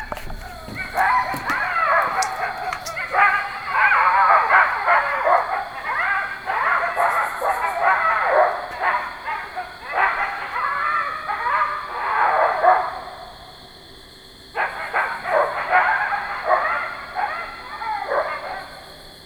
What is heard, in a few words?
coyote dog